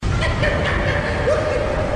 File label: laugh; Joker